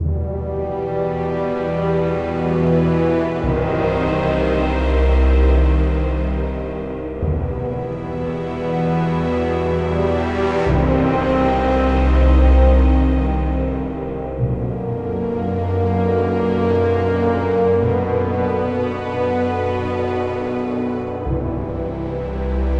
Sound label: Music Level Citadel Throne Room